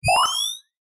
science fiction robotic cyberpunk electronic equipment beep ascend power; zapsplat